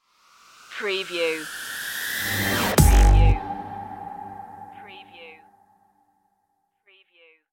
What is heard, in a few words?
subharmonic panic stinger